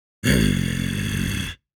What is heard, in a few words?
fighting vocal angry growl male voice fantasy; dungeon master; fantasy character